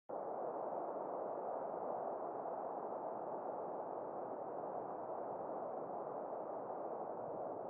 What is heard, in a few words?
gentle